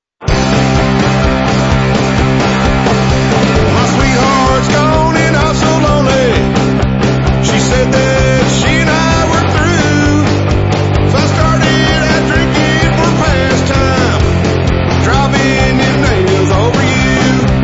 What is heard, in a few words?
Song 33rpm